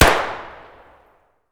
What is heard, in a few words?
tt fire outdoor distant; Escape from Tarkov